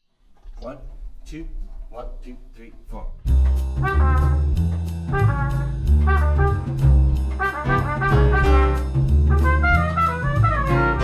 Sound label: trumpet latin